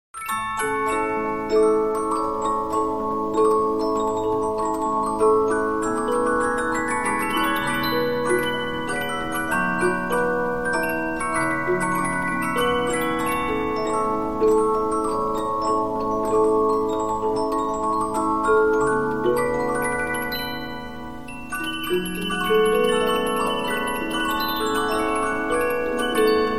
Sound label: Polyphon, 15inch disc musicbox